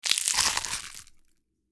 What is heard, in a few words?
Snail squish break crack; slug and worm; squelchy and organic